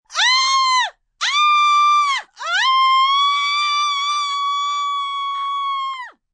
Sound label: Scream Multi, Scream, female, series of pain screams, fear, terror (human voice)